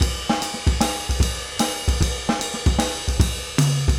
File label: jazz